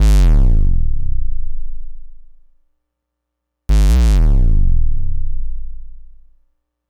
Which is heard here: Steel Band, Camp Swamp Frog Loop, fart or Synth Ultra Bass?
Synth Ultra Bass